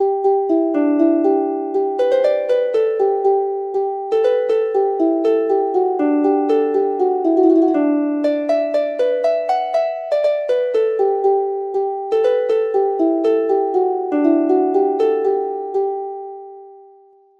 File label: harp